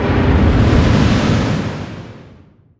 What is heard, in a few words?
distance ship miss small effect; Hearts of Iron IV Death or Dishonor